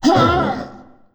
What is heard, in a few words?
grunts; finalmother